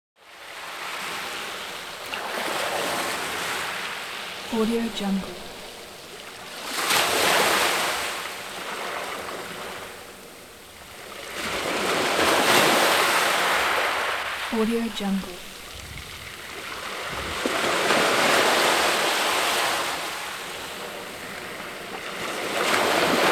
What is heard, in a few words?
light waves on sea shore